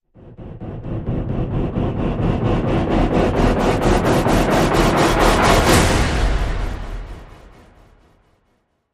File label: Rise Pulser